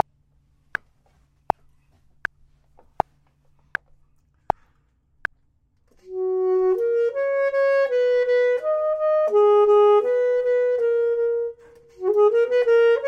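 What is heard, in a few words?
top, Saxophone and Clarinet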